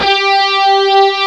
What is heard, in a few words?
guitar G4; AKAI S-Series CD-ROM Sound Library VOL-8; SET#1 GUITAR